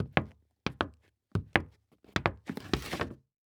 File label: Foley Footstep Hard Sole Dress Shoe Walk And Skid On Hollow Wood Surface; Foley Footsteps